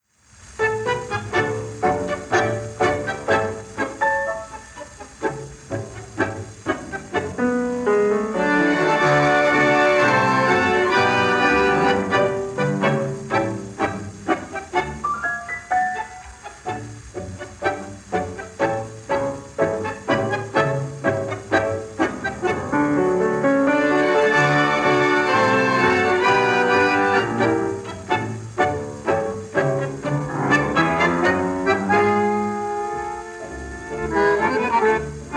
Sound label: Tango